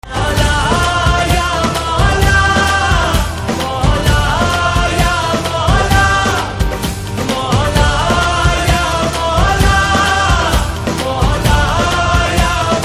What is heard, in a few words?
Action Tamil